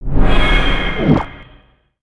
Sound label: Panda Roll Charge